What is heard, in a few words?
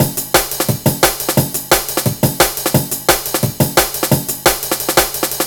classic dnb breaks, A, 175; Scotty